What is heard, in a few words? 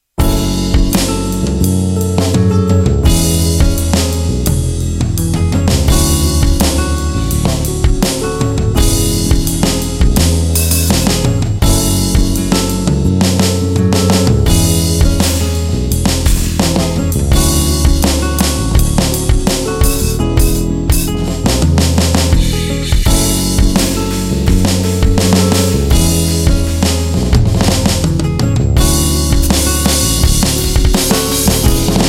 Spring Ballad